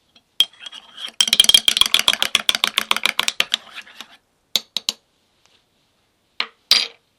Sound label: Stirring Coffee